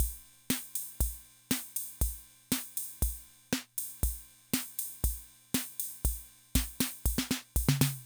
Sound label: Loop, rhythm 55, classic machines